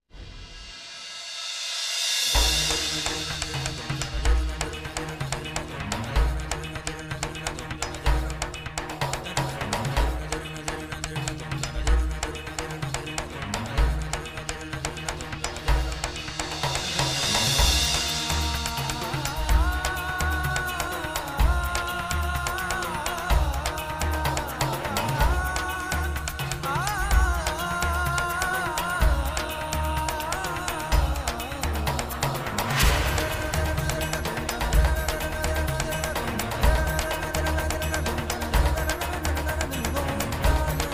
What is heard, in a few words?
soundtrack sad; uttaran; clear sound